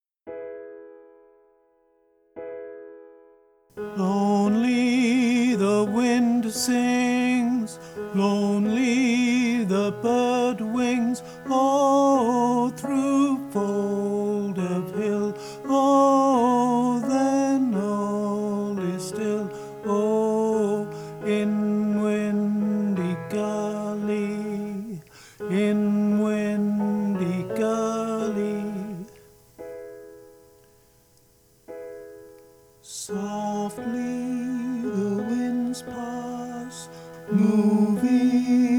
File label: windy gully tenor sung